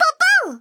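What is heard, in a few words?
squeak hunt; ragemap2019; giegue; poppo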